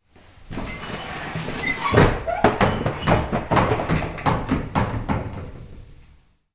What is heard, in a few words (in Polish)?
Drzwi Saloon